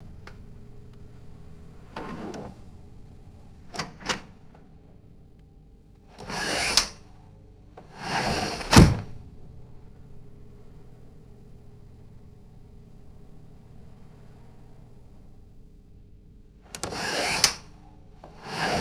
modern sash window opening and closing; glass

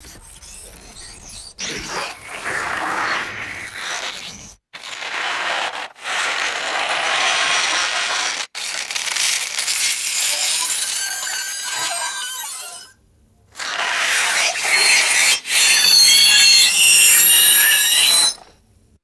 heavy abrasive tonal scrape and squeal; ice

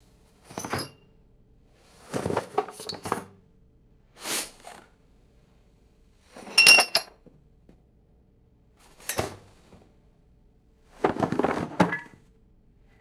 glass bottles clinking in cardboard carrier

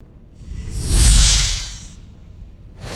thick flanged electric air whoosh

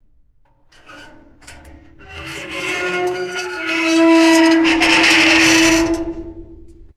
heavy metallic screech and scraping drag

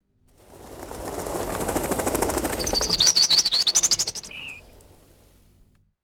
rapid bird wingbeats and distant call

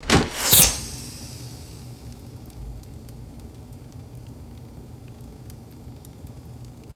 hard snap and gas fireplace ignition whoosh

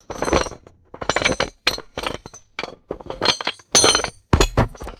heavy metal bricks sliding and dropping

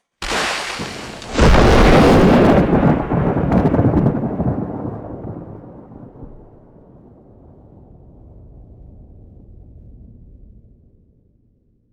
explosive thunder crack and rolling rumble

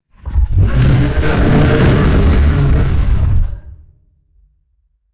deep rumbling giant creature grunt

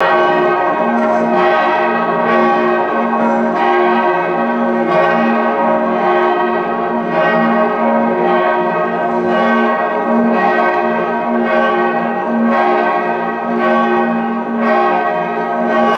large cathedral bell tolling slowly

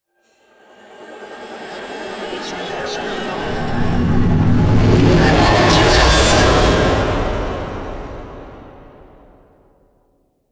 dark futuristic stuttering metallic riser; designed

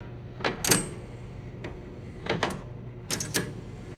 rapid mechanical lever clunks and clicks; boats

